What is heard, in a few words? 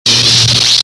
saber block